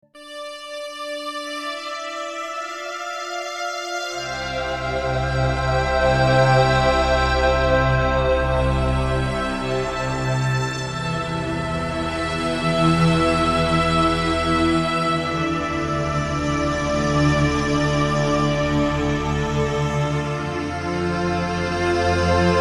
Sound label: pad